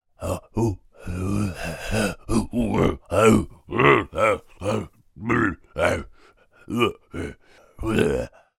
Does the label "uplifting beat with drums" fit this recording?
No